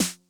Snare Mid Tuning Mid Tone Max Snap, 909; classic machines

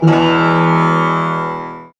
SYN, Roland, SYN DIGITAL; Sound & Vision - Gigapack I CD 2

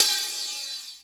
HIHAT HIP; Best Service Dance Mega Drums

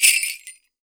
MIXED PERC; 90 s Sample CDs

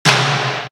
Tom, Drum One Shots; Coldcut's Kleptomania VOL1

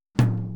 Boogaloo, Intro, Tom Rack, Drums, 82 BPM

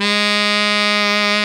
baritone sax G#2; InVision Interactive; Lightware VOL-1; Instruments & Percussions

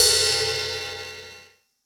One Shot Ride, Drum N Bass - Percussion; Sonic Foundry (Sony Creative Software); Knutrix Drum & Bass

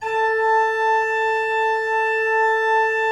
16-8 A4, Cathedral Organ; Propeller Island

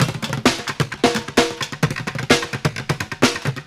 Street Groove, Ambient Grooves

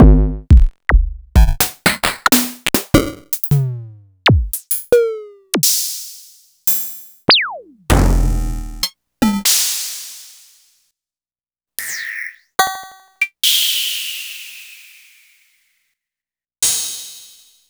Korg Radias

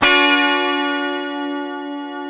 C 4 FUNK CHORDS, Guitars; Spectrasonics; Hans Zimmer Guitars VOL-1

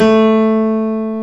KLAVINS A3; East West - Global Sounds

